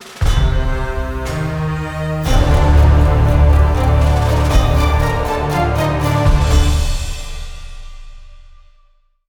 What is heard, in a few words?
Round Start; Gorod Krovi